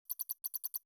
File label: typping